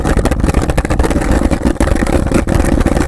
roll brick

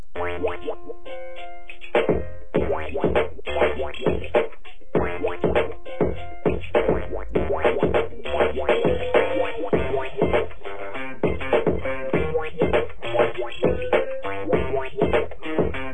beat

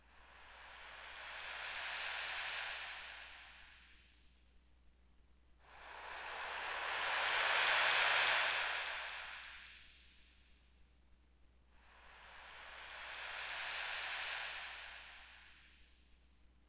waves

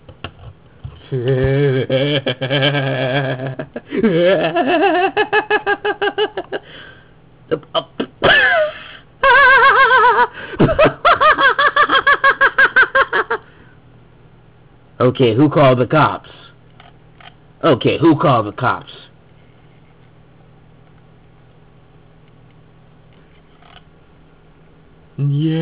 laugh; joker

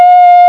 beep; Brickshelf

